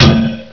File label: CLANG, 碰撞声音素材